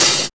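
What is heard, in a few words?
OHH, open hi-hat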